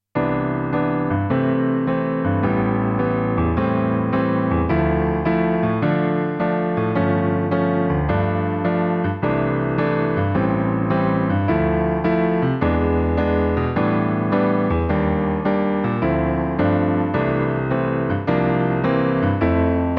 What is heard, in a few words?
karaoke